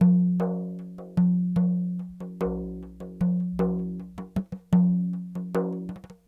Sm Frame, World Percussion

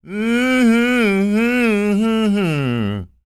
SING, Blues Soul; Spectrasonics; Vocal Planet

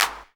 CLAPS HIP; Best Service Dance Mega Drums

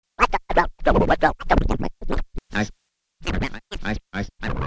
Scratch; Sonic Foundry (Sony Creative Software); Infinite Soul VOL-1; Turntable

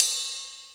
HH, HIHAT HIP, Dance Mega Drums; Best Service

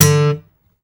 GUI Ac. D3, GUIT AC; Best Service Gigapack 2

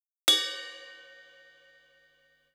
RM1X Drum Anlg Kit Ride Cymbal Cup; Yamaha RM1X

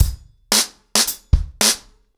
110BPM, ACOUSTIC DRUMS; The Roots Drumloops

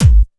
kick dance, techno trance drums; Techno Trance Essentials

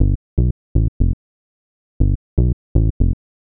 Smooth Bass (120BPM), retro house

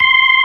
MID FAST C6, ToneWheel Organ; E-MU Formula 4000 Series Vol. 3; Analog Odyssey

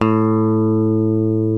LES PAUL

